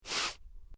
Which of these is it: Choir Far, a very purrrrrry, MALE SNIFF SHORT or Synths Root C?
MALE SNIFF SHORT